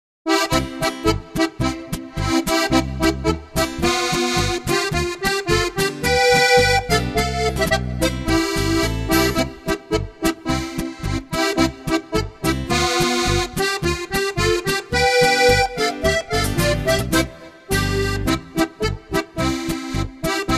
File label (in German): polka rucksack